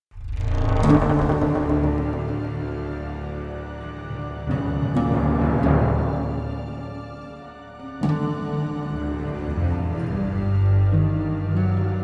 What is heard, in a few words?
Ambient North Africa